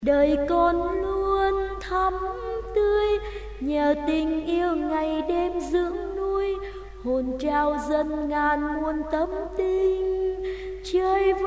tenor

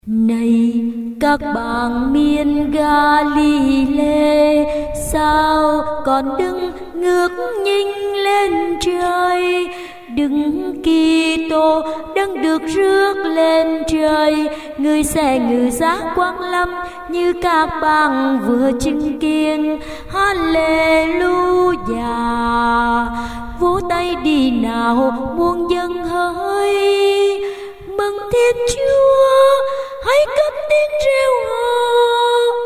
basso

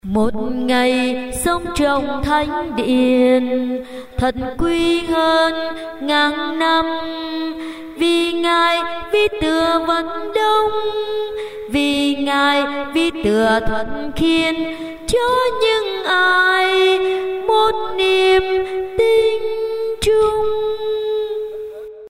tenor basso

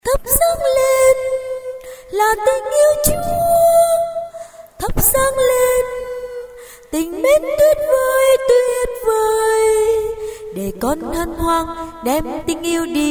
tenor

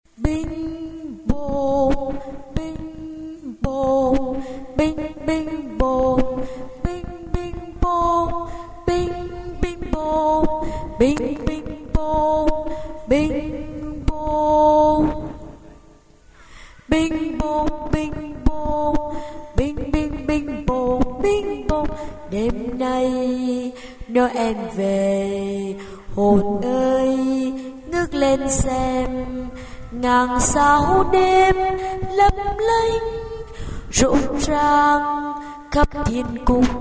alto

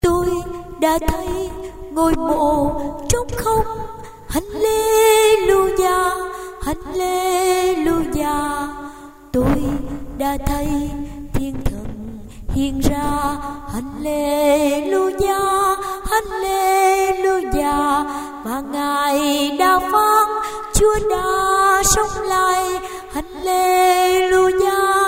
alto

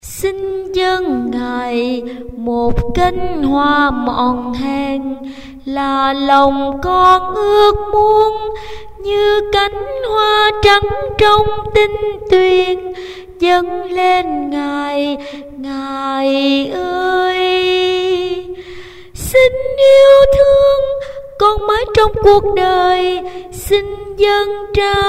sop alto

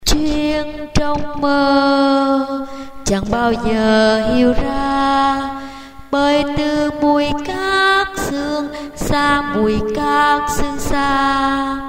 basso

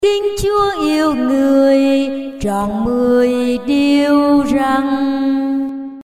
alto

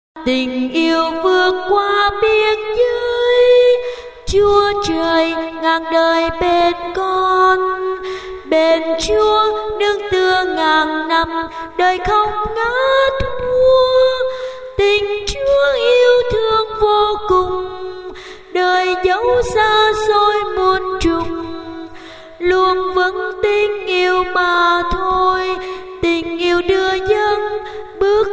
tenor

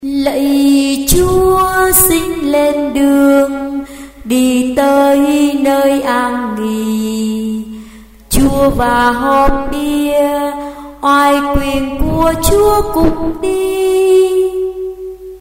sop alto

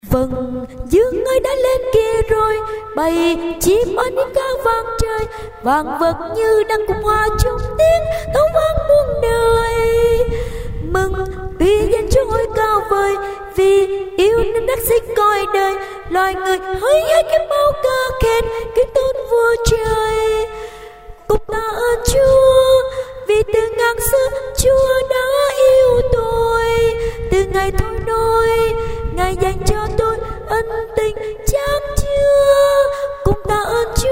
tenor basso

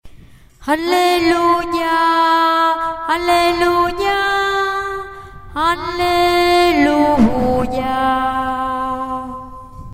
basso

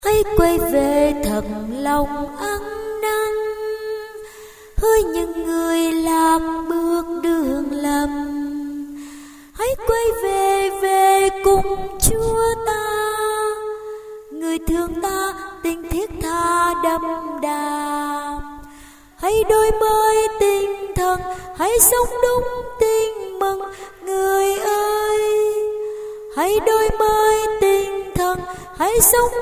tenor basso

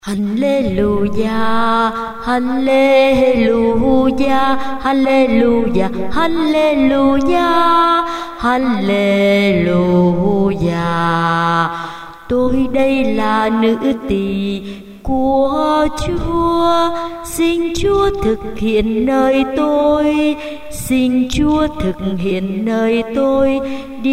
alto basso

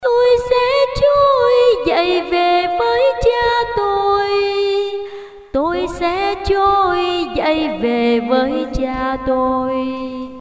sop alto

